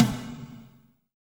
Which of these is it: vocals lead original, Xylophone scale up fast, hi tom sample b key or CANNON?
hi tom sample b key